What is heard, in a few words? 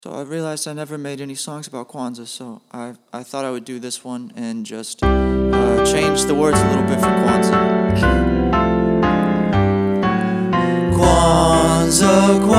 Kwanza Song